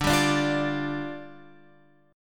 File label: D Minor Double Flat 5th